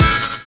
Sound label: HARMONICA